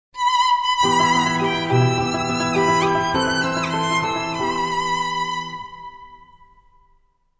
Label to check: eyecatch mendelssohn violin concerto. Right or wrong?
Right